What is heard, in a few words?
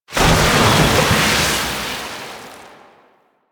Tidal Wave Cast; Warframe; Warframes; Pirate